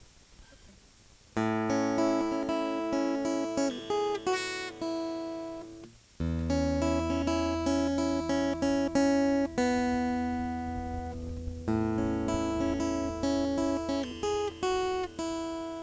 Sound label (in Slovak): iba gitara melodia; Strom